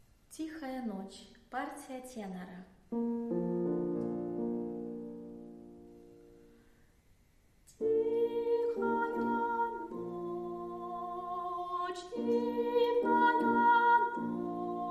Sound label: tenor; Tihaya noch